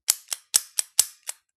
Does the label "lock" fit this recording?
Yes